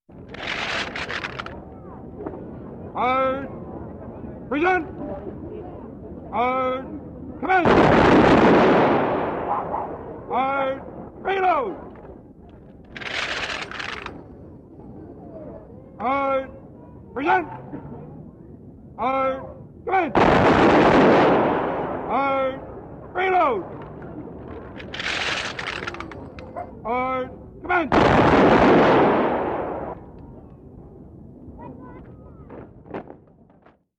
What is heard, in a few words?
Line Infantry Shots